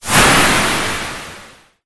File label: motion; animal